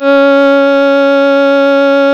C#, strings; Big Fish Audio

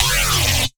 Oneshot, bass

